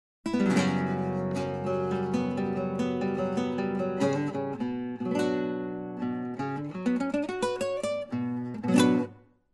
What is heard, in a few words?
Without Reverb